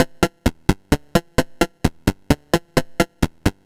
Juno-60 Arp, 130BPM; musicradar; bitcrushed; Crushed Loops

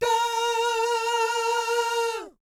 VIB FX, Vocal Planet Jazz & FX; ILIO; JAZZCOMBO

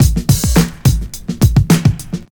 house loop

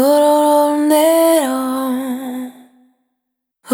Vapor, Single Voice Melodic Loops; Pocket Diva World Class Vocal Samples

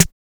SRB600 SD

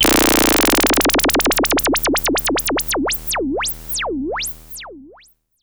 SH-09 LFO Drop

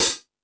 Metal Drums, E-MU Pro-Cussion; E-MU Pro-Cussion-1

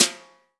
sfx perc

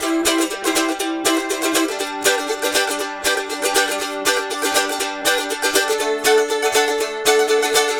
Mandolin Rhythm; Appalachian; Continental Drift Aftershocks

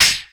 VOCDRUM, MOUTH PERC; Best Service; Hyper Dance

